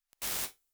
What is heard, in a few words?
Sudden Stop, Lexicon 480L Reverb, impulse responses; Big Gee's